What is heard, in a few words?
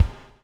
BUBBLE; Spectrasonics - Backbeat